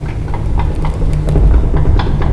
train

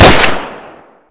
gun; DarkCity

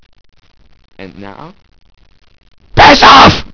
train room

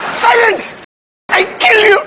silence

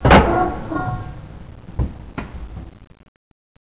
rail stop

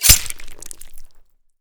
hit, knife

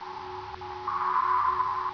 suspence, music

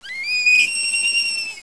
pit drone idle; betapitdrone

